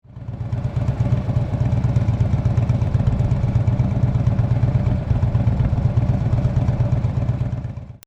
IDLE, Twin Slash 2-Into-1 Slip-On Muffler For Harley Dyna; Exhaust Sound; 2012-2017